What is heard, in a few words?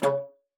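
C#3, bass flute; Xsample VOL-2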